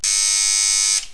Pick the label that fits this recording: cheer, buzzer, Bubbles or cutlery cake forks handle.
buzzer